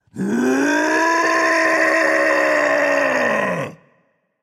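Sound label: non-verbal battlecry; smite; atlas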